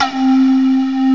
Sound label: pan